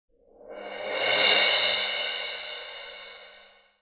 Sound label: Cymbal Roll